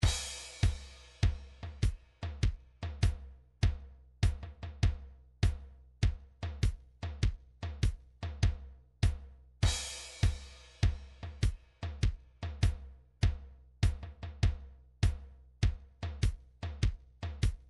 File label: midi drums swing